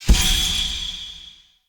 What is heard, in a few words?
Magic Parry Perfect Counter; Casting Devices